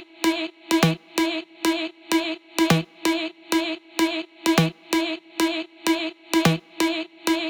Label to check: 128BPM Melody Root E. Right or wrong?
Right